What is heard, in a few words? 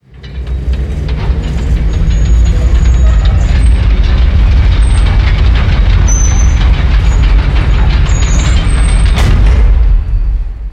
Pillar Dry